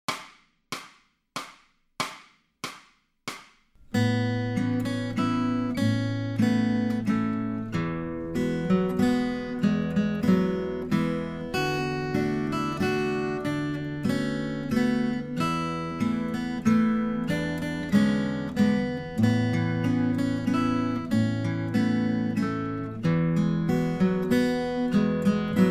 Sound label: acoustic guitar, accompaniment; Grade 4